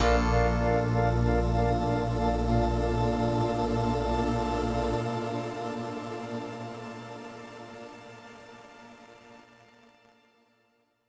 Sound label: Intro Drone